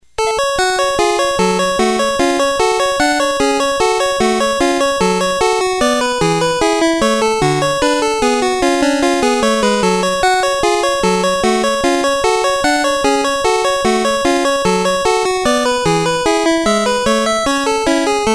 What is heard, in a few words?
Music Type C; Tetris